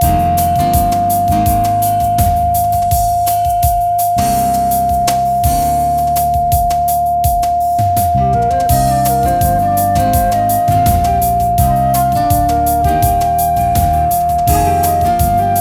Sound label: test 700Hz